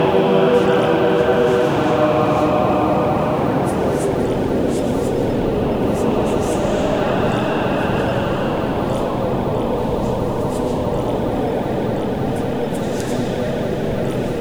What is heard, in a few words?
a big crowd of people; cathedral